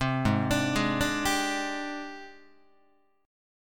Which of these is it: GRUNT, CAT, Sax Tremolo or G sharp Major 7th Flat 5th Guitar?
G sharp Major 7th Flat 5th Guitar